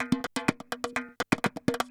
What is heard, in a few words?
PERCLOOP ETHNO 125